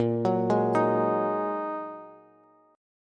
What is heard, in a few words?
Bb 7th Suspended 4th Sharp 5th Bb down Bass; arpeggiated